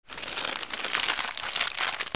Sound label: PAPER